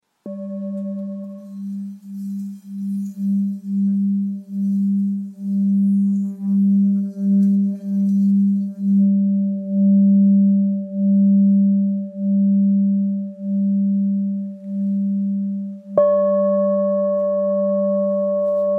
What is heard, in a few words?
Full Moon Sol